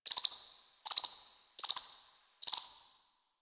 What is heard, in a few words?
Cricket